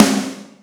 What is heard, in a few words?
soft hit clap